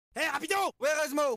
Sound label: ringtone